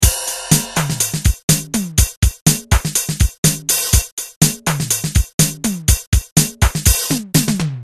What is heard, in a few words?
beat